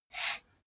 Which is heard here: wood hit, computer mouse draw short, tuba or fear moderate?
computer mouse draw short